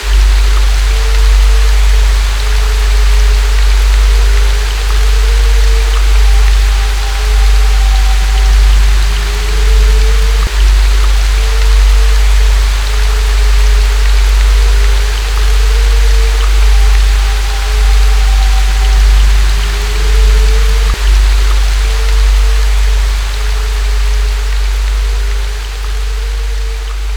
Haunted Rain; luigis mansion dark moon; 3ds switch

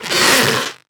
turn, water balloon

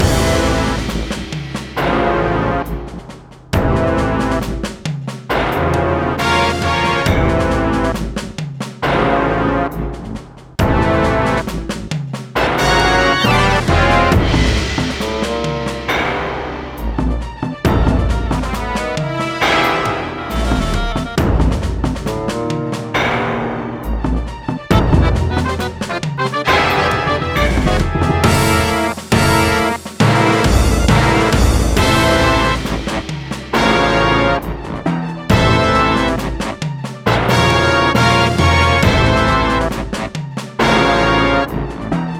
Boss Phase1 Loop; Sparklite

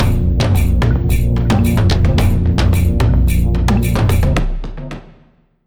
basicMood Cueva Bosque

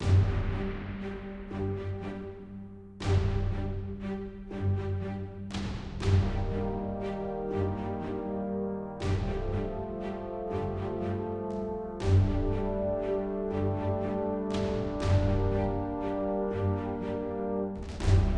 Ascension After Sting Bed

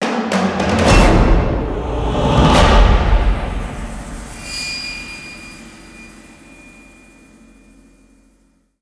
Combat Intro

